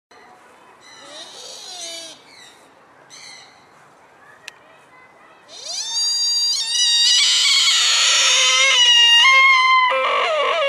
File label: sika deer call; chesapeakebay